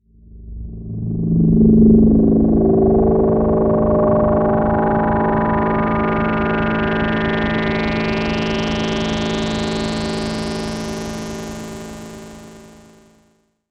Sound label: synth rise